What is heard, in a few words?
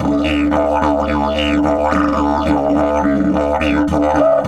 108, DIDGE 108BPM